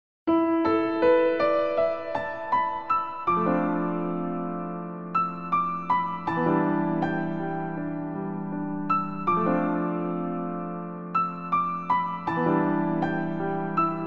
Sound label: naruto piano theme